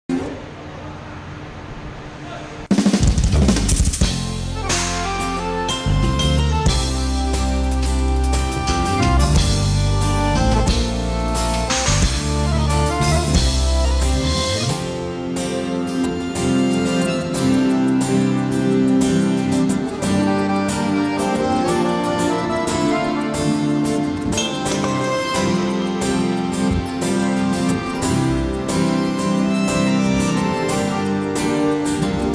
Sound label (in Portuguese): ensaio sertanejo